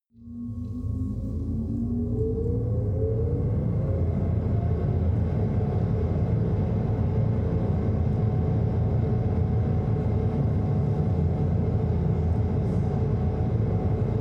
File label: machine room drone